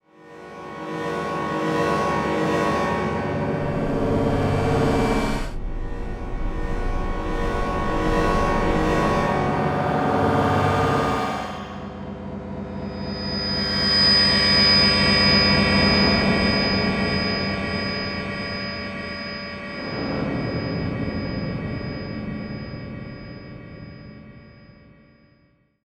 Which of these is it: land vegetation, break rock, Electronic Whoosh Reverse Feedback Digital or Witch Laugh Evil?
Electronic Whoosh Reverse Feedback Digital